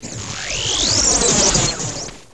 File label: HOLO GON; PLAYER